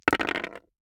DICE on Hard Wood, Throw and Roll, Metal, 2 Two Dice; Samples; ArticulatedSounds; DICE Sfx Party (Extended)